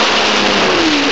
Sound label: BOMB; batlords; c't freeware shareware 2001 January